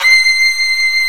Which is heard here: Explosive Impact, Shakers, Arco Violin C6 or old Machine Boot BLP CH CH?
Arco Violin C6